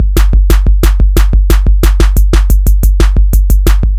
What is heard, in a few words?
Groove Pizza 90bpm